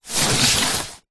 cardboard box parcel heavy slide wood deck external; zapsplat